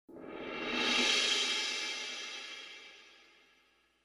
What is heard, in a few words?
Midwest no Perc; TWC Branding Music; TWC 2003